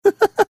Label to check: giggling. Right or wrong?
Right